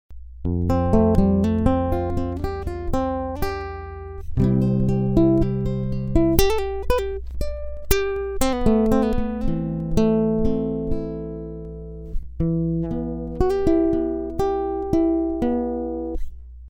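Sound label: lead, Guitar 2 (accompany)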